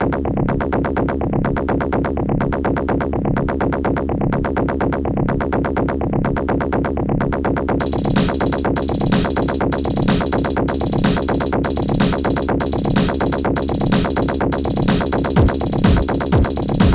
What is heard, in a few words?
bass; MOD